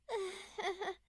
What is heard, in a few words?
"ehe"